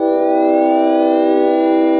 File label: Synthesizer Middle C Notes, Lead